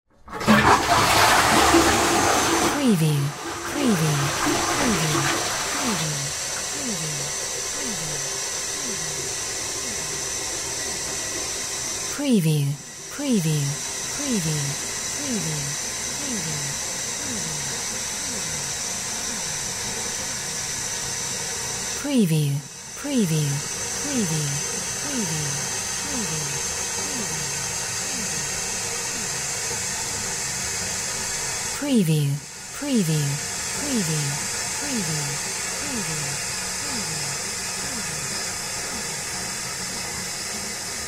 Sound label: TOILET FLUSH